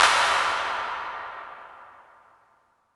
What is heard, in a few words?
CLAPS PLATE